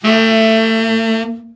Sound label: BRA TENOR OB, SAX